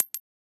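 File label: button click; wbg games; risk coin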